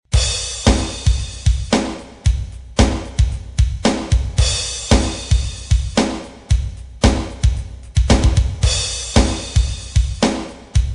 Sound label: Drums